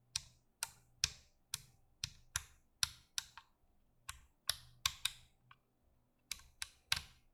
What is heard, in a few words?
Rotarys